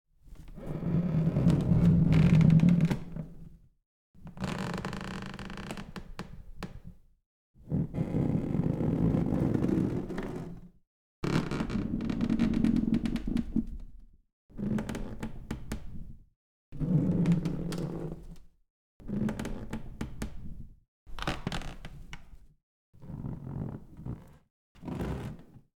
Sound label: Creaking Tension Ship Wood